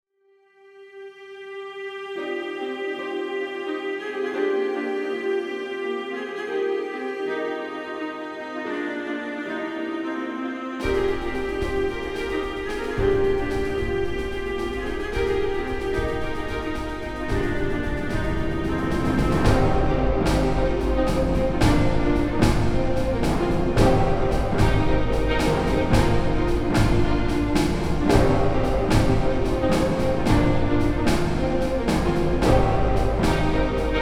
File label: Traditional Orchestra Red Panic; AuguryEngine